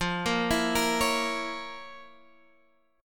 F Major 7th Suspended 4th Sharp 5th, Guitar, Open F; FM7sus4#5; 17,17,16,17,16,x; arpeggiated